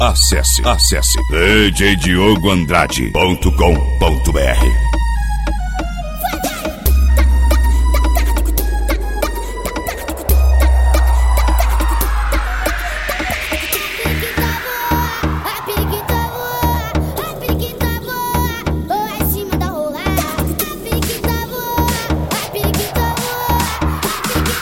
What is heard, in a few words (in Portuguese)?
eletro funk